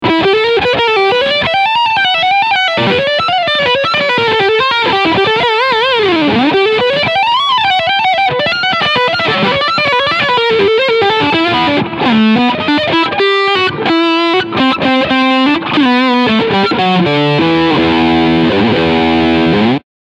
Leadsound Steg

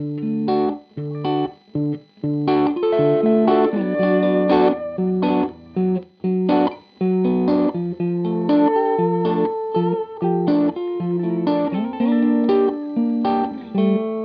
Walrus Audio ACS1 Mittel Gain Room; London

